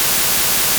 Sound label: White Noise